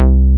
bass Mix Moog; Best Service Gigapack 2

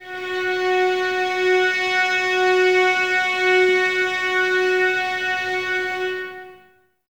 violins F#4, string ensembles; Miroslav Vitous; 11 Violins

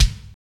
KIT; Roland - LA Composer vol. 2

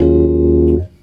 Chords Tones; Equipped Music; Loungin House

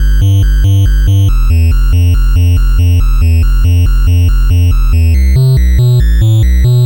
NES Bass, chiptune

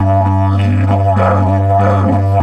DIDGE 100BPM, Didgeridoo

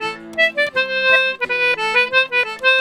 C1 L, Celtic Flavours; USB Soundscan vol.42; AKAI

